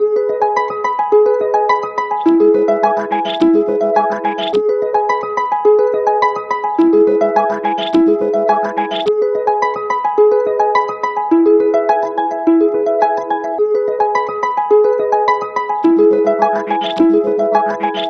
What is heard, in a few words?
Bionic Harp; Sonic Foundry (Sony Creative Software); Club Joints and Street Anthems; Lions Den